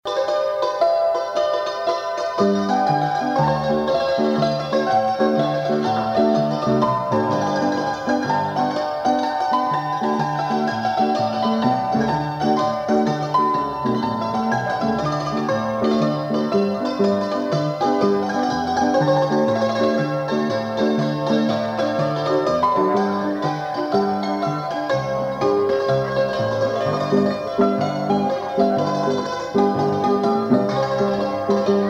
Quadrille; Pacific Quadrille; greatlakes

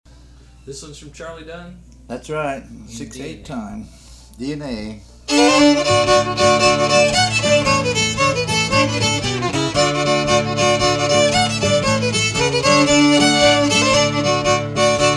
Jig; Charlie Dunns D and A Jig

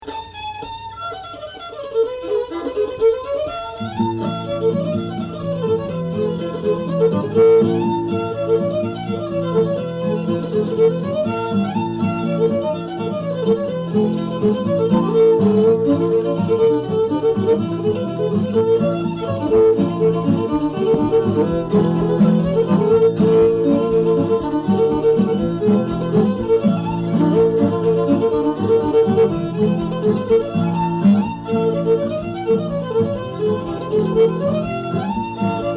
Breakdown in A; greatlakes

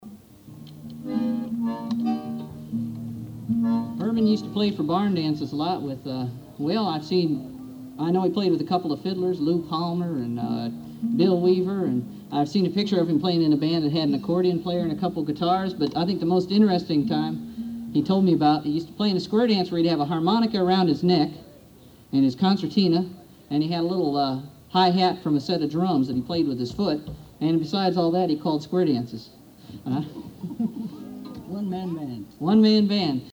playing for dances; greatlakes; battleground

